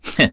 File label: vox Laugh; Ezekiel; kingsraid